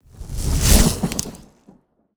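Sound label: shock charge big, magic, lightning; skyrim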